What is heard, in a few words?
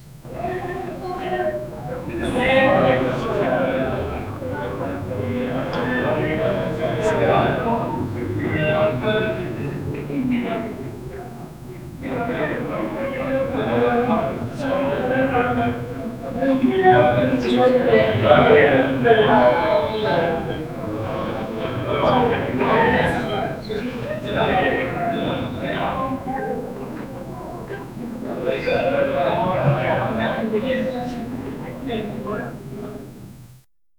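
background noise of people, party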